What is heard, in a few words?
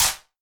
Wider Clap